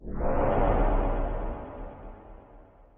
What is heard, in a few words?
warp; no longer alone sfx